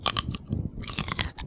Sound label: cracking crushing a grape with teeth; Experience the distinct, visceral sound of a grape being crushed between tee